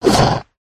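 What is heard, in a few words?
lizard dodge; yasher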